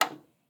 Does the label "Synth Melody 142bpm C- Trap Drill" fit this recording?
No